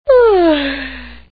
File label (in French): Baillement